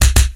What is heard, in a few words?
middle punch; attack